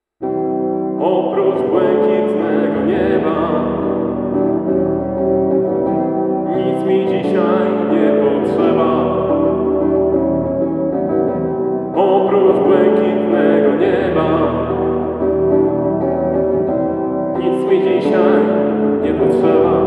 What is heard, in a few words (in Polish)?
kosciol duet